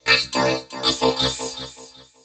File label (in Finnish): huomio sms